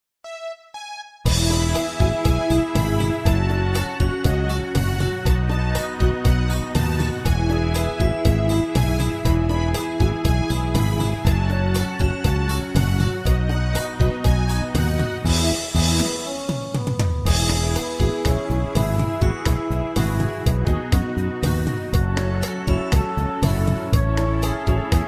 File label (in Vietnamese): beat